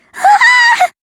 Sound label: scream